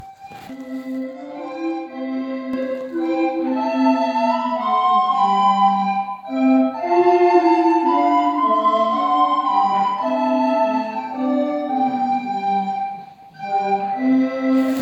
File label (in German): floetengruppe